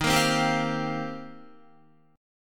Eb 6th Add 9th, Guitar; strummed

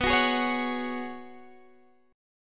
Db 7th Suspended 4th B, guitar

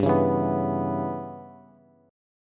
G-6th Flat 5th-G-15,16,14,16 down Bass; G6b5 strummed

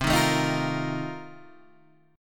Bb Major 7th Suspended 2nd Suspended 4th

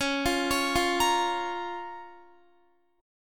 Db 6th Db, down, Guitar; arpeggiated

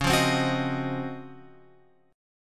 C sharp Major 11th, chord; C#M11 Chord {9 8 10 11 X 11} strummed

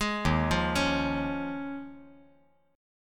Eb 7th Suspended 2nd Suspended 4th Eb; 1,3,1,4; Eb7sus2sus4 arpeggiated; JGuitar

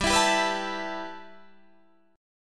G 6th Add 9th, Guitar, Open D

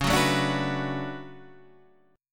D Major 13th C sharp, chord

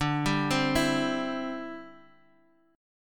D 9th Suspended 4th, chord; arpeggiated; JGuitar

